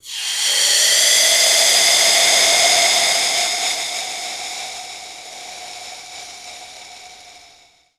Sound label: HOT METAL; ENVIRN-AMB